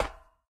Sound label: ladder step; Golden Crayon Sounds